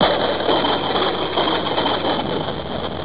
lightning; Deliantra

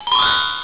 magic; Deliantra

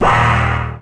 evil note; deliantra

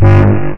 announce bass; deliantra

